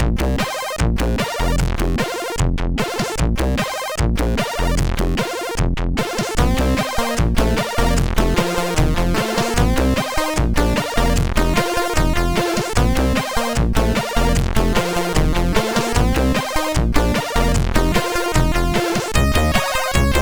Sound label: Intro Song, MOS6581R4; hvsc